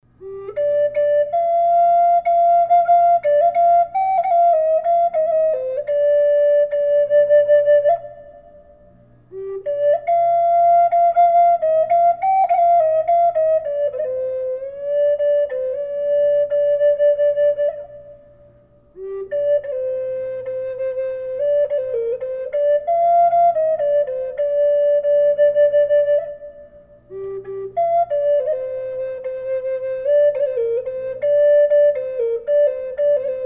g reverb, flute; red horse; collector flutes

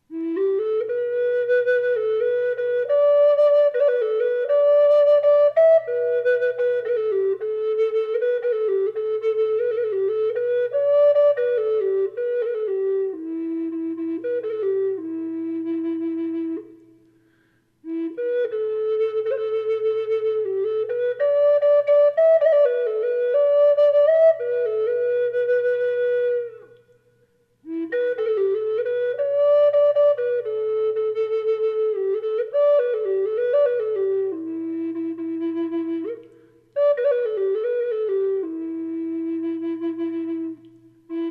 e minor reverb flute; dove; collector flutes

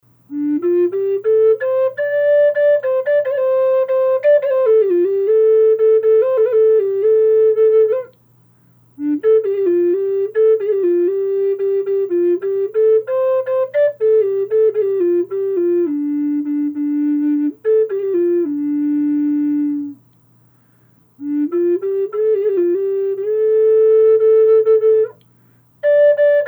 flutes; heartline buffalo d; collector flutes

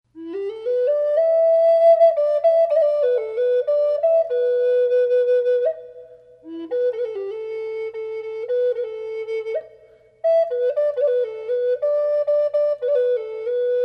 Polar Bear Flute reverb

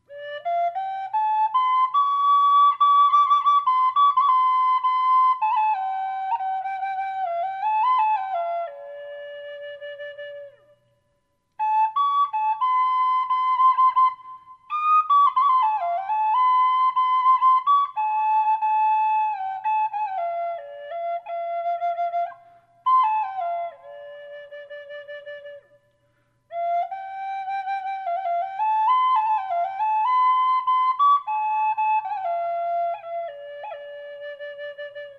high d reverb, flutes

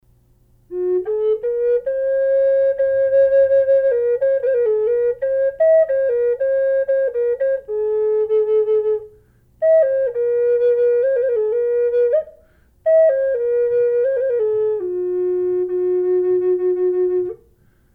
desert ironwood dolphin flute; collector flutes

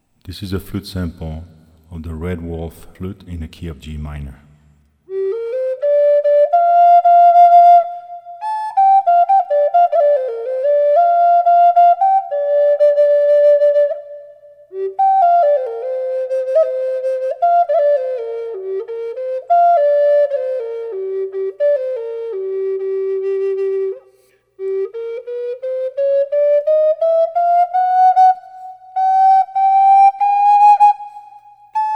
redwolf flute reverb

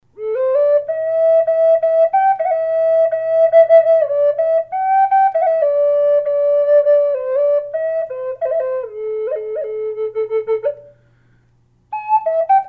squirrel a minor, flutes; collector flutes

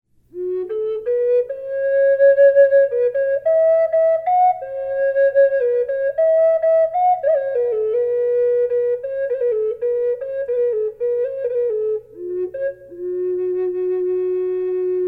buckeye reverb burl wolf, flutes; collector flutes

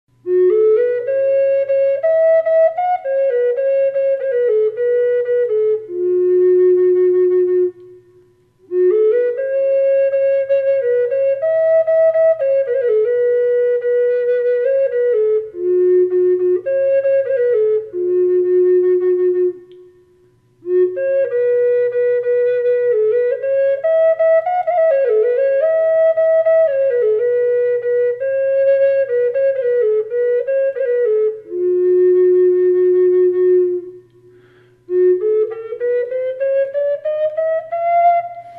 she flute reverb

